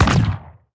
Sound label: heroes basic Attack impact; onagro